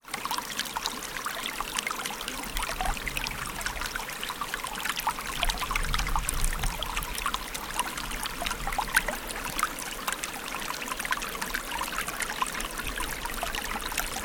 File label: flowing water river shannon